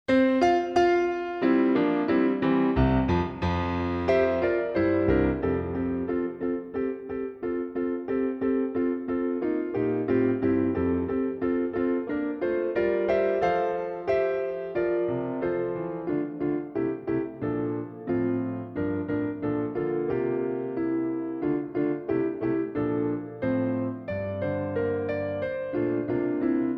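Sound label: piano only, quarter note=90; Play-a-long; Soaring; trumpet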